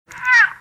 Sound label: meowing voice recorder